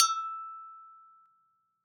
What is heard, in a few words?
Agogo; World Percussion; Cymatics; Emperor World Percussion